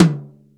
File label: R-8 rude tom, Dry